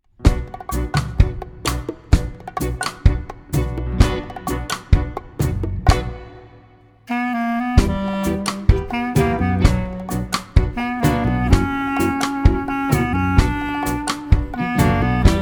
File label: Tango